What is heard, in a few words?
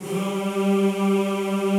G2, Extended Classical Choir; Best Service